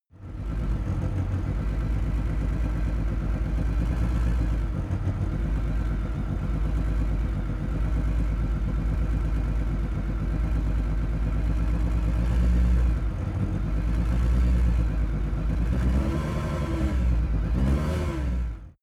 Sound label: car lancia s4 idle short revs